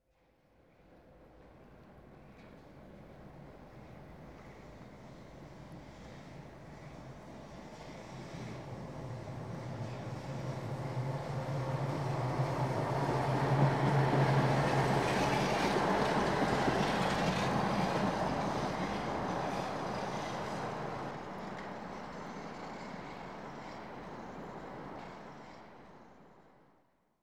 Streetcar Passes Right To Left, The Czech Republic Slovakia; BBC Sound Effects Library